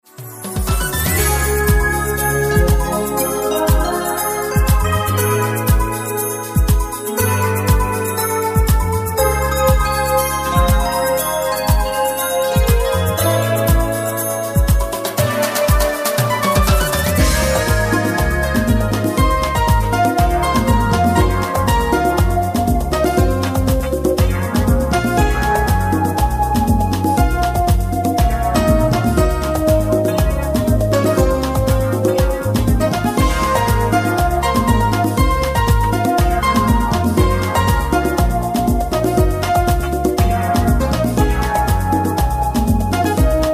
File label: latin style